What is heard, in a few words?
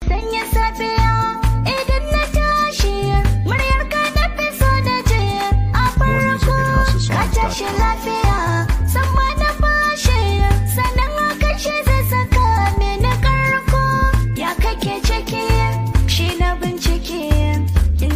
Hausa Soundtrack